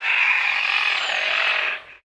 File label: Coel sound